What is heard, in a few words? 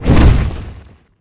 fireball; battle